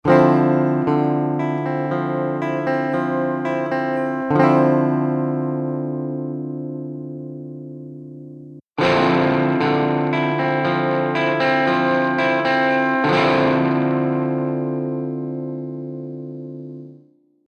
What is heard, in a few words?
BELDEN 8412 Gt